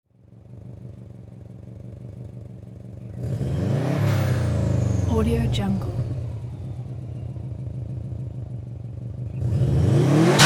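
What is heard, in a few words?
turbo racing car revs with close idle; hollywoodedge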